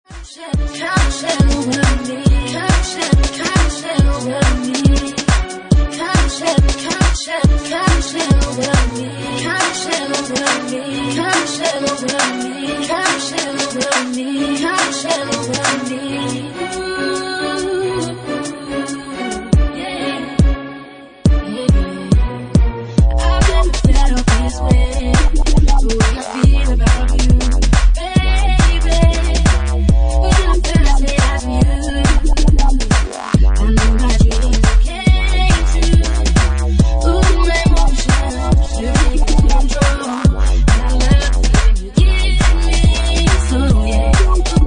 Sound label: Bassline Mix